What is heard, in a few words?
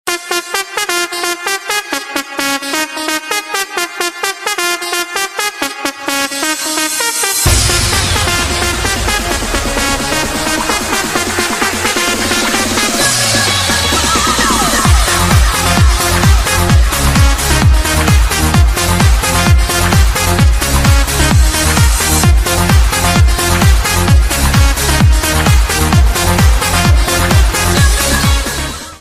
nice tone for iphone ringtone